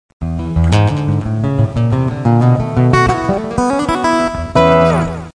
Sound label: acoustic blues